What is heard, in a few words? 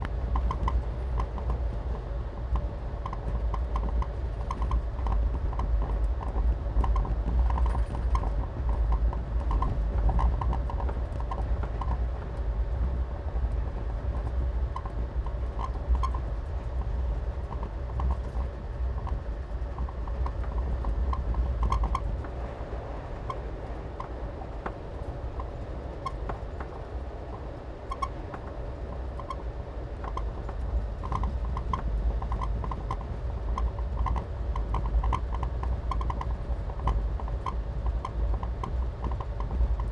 industrial squeeks on train, South India; South India street recordings